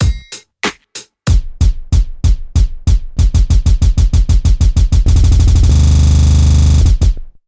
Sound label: KP3+ brk Loop